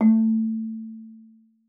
marimba A3; Xsample VOL-4

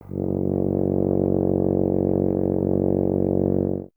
BRS TUBA, Solo Instruments; Miroslav Vitous - Solo Instruments VOL-2

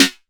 Snare Delay, TR-606 Bent Samples; Sonic Foundry (Sony Creative Software); The Electronic Music Manuscript Collection; Battery 3 Sample Kits

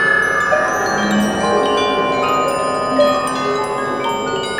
G#3 haunt, spirit bells; Northstar; The Wizard VOL-1

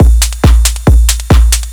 drum-loops, bass-department, techno, 138; Techno ID

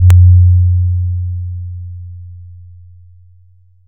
Tomm, One Shots; Sonic Foundry (Sony Creative Software) - Future Retro Dance Excursions

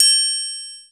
Bing Tri; EMU MoPhatt

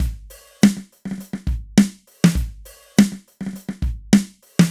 Wooden Kit, V Drum; Sonic Foundry (Sony Creative Software); Electronic Point Blank; Drums & Percussion

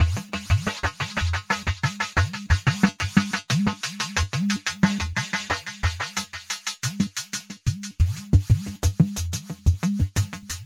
NRG Percussion, Trance

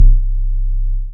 BAS MINI, Mini-Moog

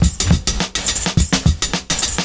70s Breakbeats; USB Soundscan vol.46; AKAI

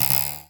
Zip Boing, cartoon

